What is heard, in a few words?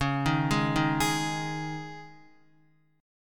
Db Suspended 2nd, Guitar; arpeggiated; JGuitar